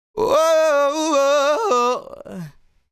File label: Ad Lib Dry, 70 BPM G# Min, Vocal; Cymatics; Euphoria Vocal Sample Pack; Ad Libs